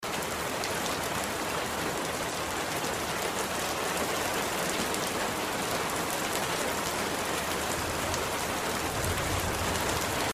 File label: ambience track rain; dragon fin soup